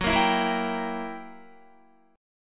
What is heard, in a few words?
Gb 7th Flat 9th Gb Guitar; Listen to Gb7b9 strummed